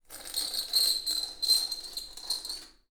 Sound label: Glass Scrape; 99Sounds; The Warehouse